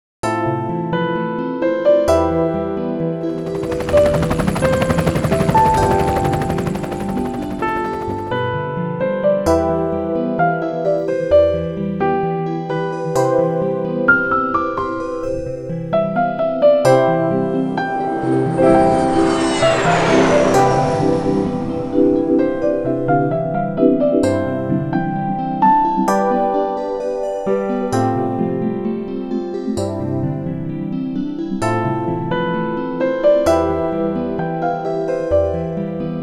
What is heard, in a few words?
Piano; DCAA Piano